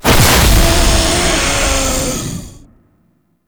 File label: Swarm Pickup Tear; Entrati